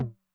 Med Tune Short Decay, 909mod MID TOM; classic machines; MT - MID TOM

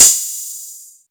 Hat Accent, 606; classic machines

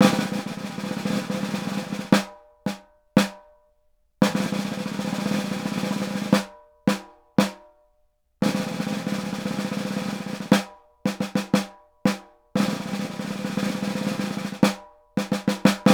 Snare, Roland LA Composer

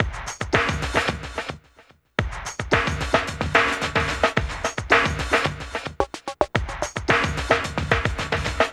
LOFI BT, Liquid Grooves; Spectrasonics

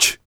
FEM PERC, Vocal Jazz FX

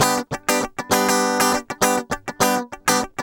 100 BPM

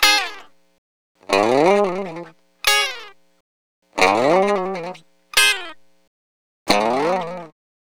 Lounge Gtr-G# Dry; Zero-G Kocktail Kollection; Construction Kitz (Lounge); Welk Lounge 090

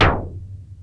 Moog SD; Moog Modular55

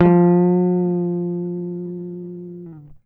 GTR TRIK, Jazzistic; Univers Sons

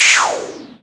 noise fx; Masterbits; Switch FX

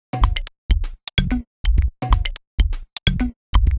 High pitch rich C# 127bpm, House Drumloops; 90 s Sample CDs; Zero-G House Fabrik Drumloops; ZG Drumloops

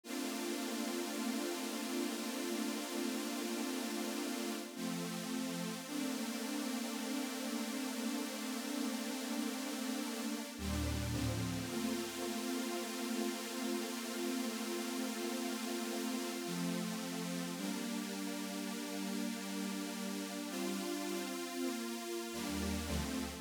pad 2A, Smooth RnB; 082 Gmin; Big Fish Audio; Legendary; Shes Mine

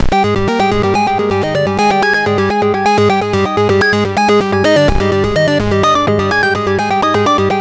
Lead

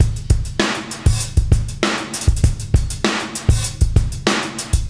fx, Beat Loops, 098; Attitude; Zero-G - Monster Beats

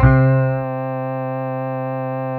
Gtr C4, Fairlight CMI IIe; Guitars

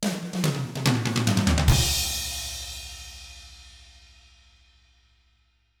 rock drums; 50S ROCK

